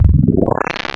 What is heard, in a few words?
Vowel; Xfer Records Serum; Serum Presets; ML Addifunk